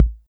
Kick TR606

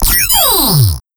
Laser shot

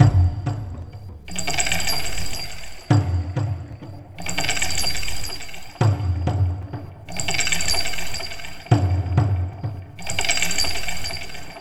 perc, pitchglitch loop

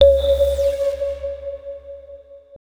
Bell 174bpm Bm, LIQUID; DNBACADEMY

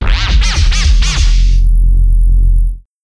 Not Reese

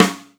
Rim Rim Snare; The Black Pearl V1

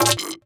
Synth; pitchglitch samples; Tommy Synth; GranularSumo

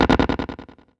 Perc Guitar FX; pitchglitch

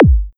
Kick Club

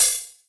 HiHat Closed Long

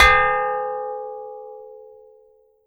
Bell Metal Bowl; Spacejoe

